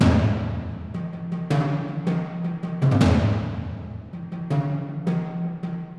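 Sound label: Violins spicca; movie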